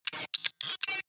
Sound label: mechanical rattle glitch, robot; comedy-cartoon; soundpool